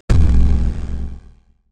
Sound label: Retro Cinematic Short